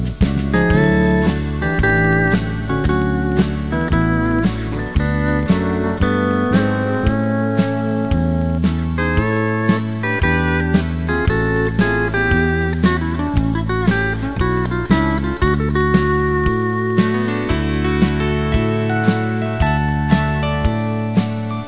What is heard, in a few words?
solo, steel gitar; Her er lydprøve på solo